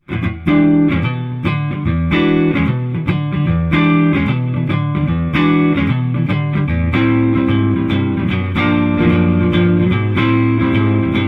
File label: guitar